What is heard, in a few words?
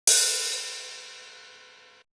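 Hi-hat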